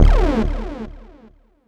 Synth Hit D; Trance; Synth Hits; Zero-G Trance Inducer; 90 s Sample CDs

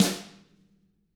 HIT, Drums, SNR; CAMCO

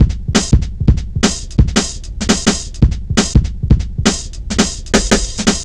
170, drum loops, drum & bass

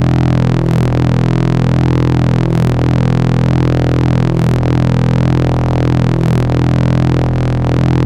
VCS RAW, VCS RAW WAVES; Zero-G Ambient 1

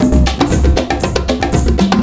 PERCS, 70 s Breakbeats

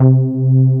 SLAM C3; Best Service Alpha Dance vol.2; SLAM SEQ.2

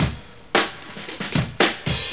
loop, breaks

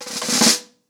Snare Cres, drums singles; The Roots Drumloops

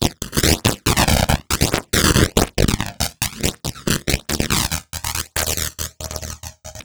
Envelope Beat; Beats; Drums & Drones

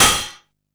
Hat, Drum & Bass

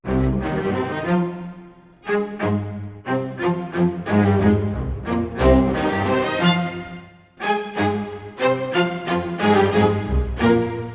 c min strings; PowerFX - Downtown Orchestra; Orchestral arrangements

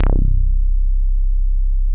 MOOG1 BASS C1

